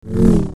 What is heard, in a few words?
Double Bladed Saber Sound; FXHome